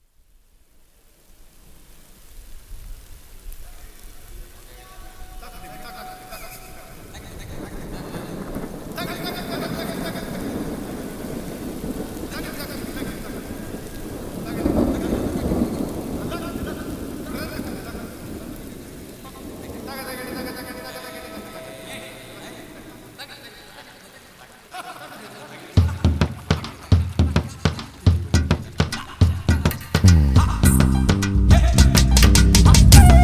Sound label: Instrumental; Sony Music Instrumental Hits